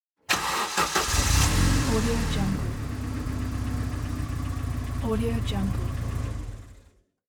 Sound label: car engine